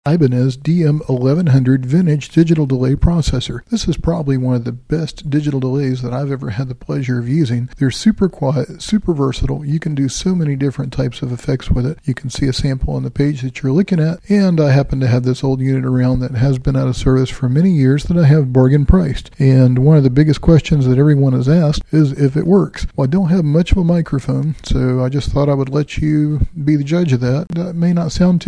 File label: Ibanez DM 1100 digital delay